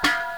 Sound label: metal hit; goatgame